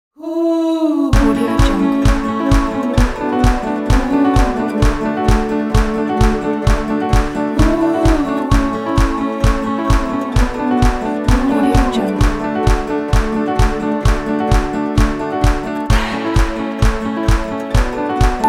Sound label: indie folk